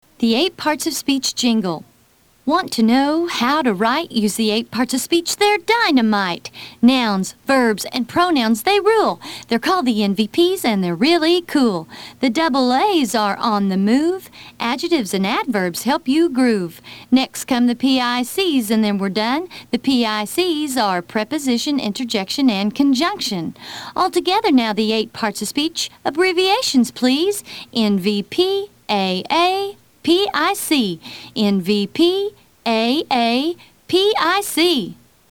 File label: eight parts of speech jingle